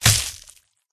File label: boss attack contact bottom; krofdor